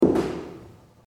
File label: Footsteps on stairs; Sound FX